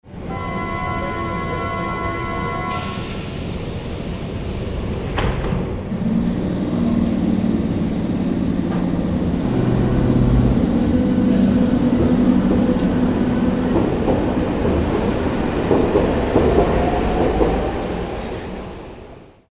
underground train leaving

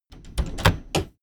train intercity train door open

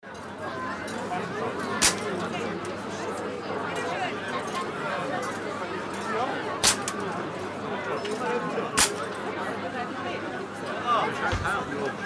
shooting gallery

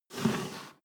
step barefoot metal slide medium

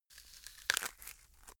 bone fracture

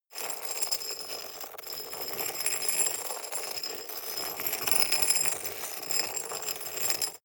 scrape stone shard soft

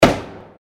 fx click

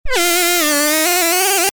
sweep mad distorted

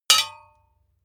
hammer hit metal

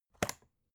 folder lever arch open

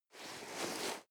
nylon leather soft

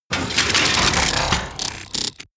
robot transformation metal

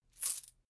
chain mail armor movement

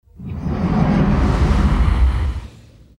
deep movement swoshes

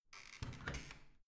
washing machine open soft

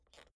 suitcase pull down latch lock soft

